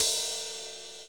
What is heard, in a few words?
Hats Real Open